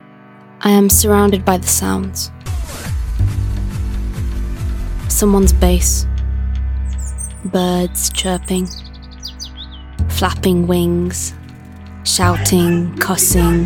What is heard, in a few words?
Soundscape; Sound and Detention